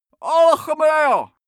male shout arabian

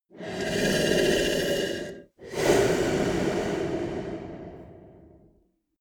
monster snore breath single; koerpergeraeusche; comedy-cartoon; soundpool

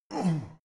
male hit soft

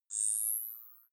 zoom in light

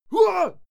male fight attack hit; soundpool

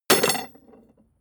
metal tools drop; soundpool

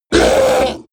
orc hit; kreaturen

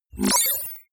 confirm sweep, signale; soundpool

